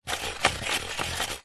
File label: technology studio controller scrub dial turn; zapsplat